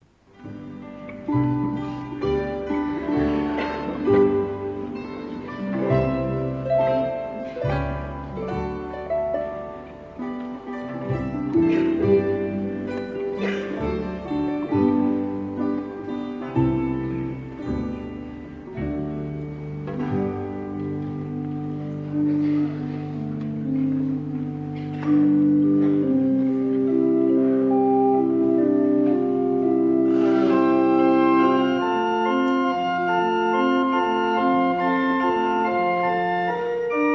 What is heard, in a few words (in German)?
orgel